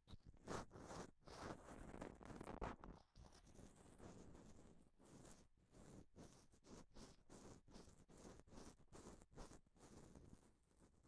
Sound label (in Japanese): 右耳かき